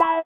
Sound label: sitar, Indiatek; Spectrasonics; Metamorphosis